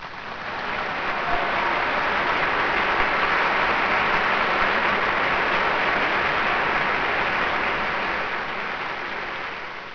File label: APPLAUSE